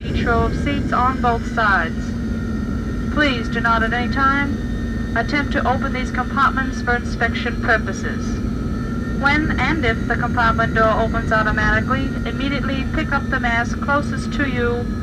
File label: Airplane Jet trip; tony schwartz